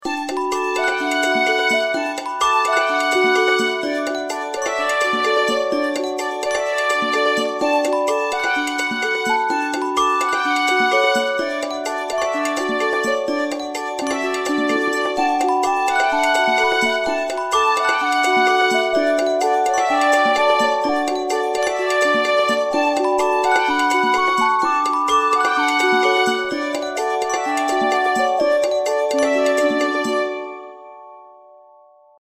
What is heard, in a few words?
Sound BGM